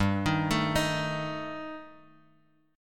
G Suspended 2nd Sharp 5th; Gsus2#5 Chord {3 X 1 2 4 X} arpeggiated

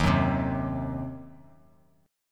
Eb Minor 6th, chord; Ebm6 strummed; JGuitar

